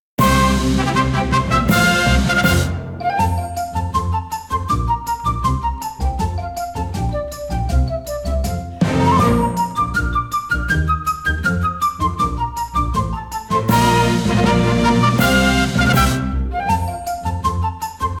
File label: Quest Clear; Pokemon Masters EX